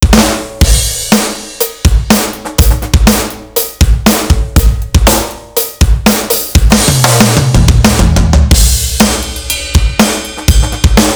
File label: fat rok a drum